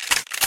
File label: load; game; pybottle; python version of bottleblaster; cli-games